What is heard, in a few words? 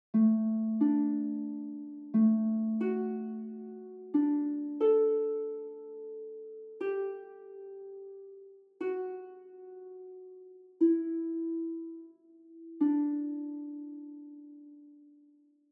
harp